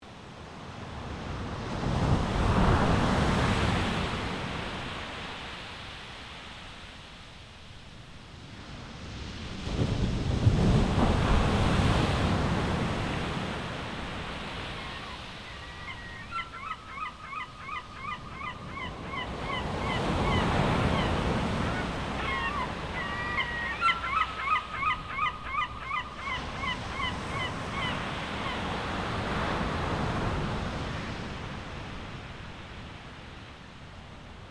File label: shore and seagull